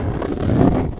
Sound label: Revolving Doors; 3DFX Velocity 100